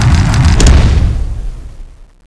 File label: skill rolling end, zombie giant; cstrike; dione